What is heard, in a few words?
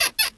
squeak